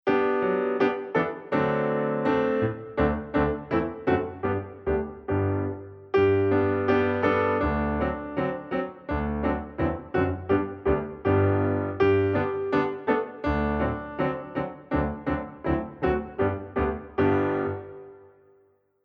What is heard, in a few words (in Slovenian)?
KARAOKE